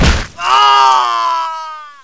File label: headshot